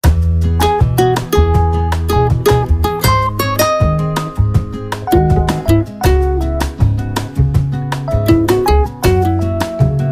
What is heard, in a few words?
Mobile Ringtone